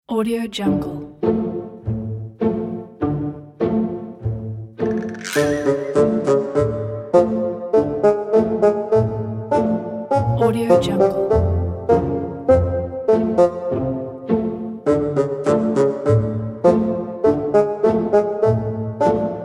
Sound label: awkward comedy